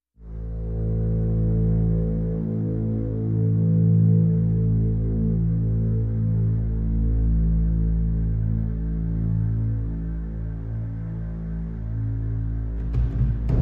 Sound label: A minor, No Guitar